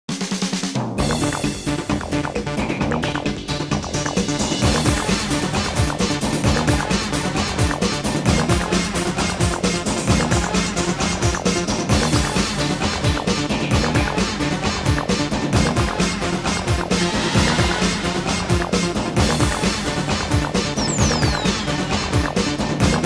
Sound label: Battle Music; tokobot plus mysteries of the karakuri; playstation 2